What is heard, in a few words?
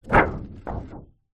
paper laminated large sheet drop; zapsplat